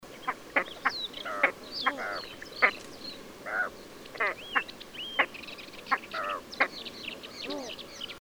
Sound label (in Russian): bolshaya poganka golos